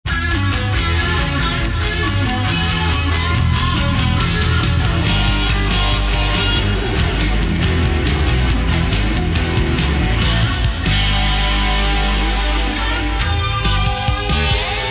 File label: Jingle